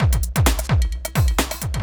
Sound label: electro kit 130